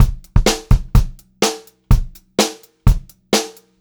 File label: Pop-Rock Drumloops, 126 POP